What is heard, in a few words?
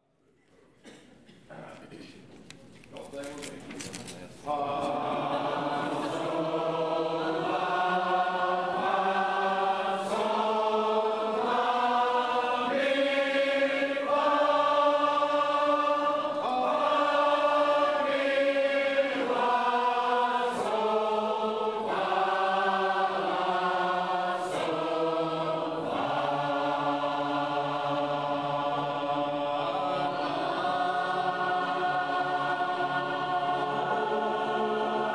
Scales